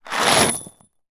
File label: industrial plastic box of drywall screws tip out on carpeted floor